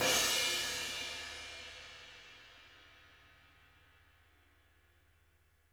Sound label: crash, Drum Kits; Sonic Reality; Interactive Drum Kits; 90s sample cds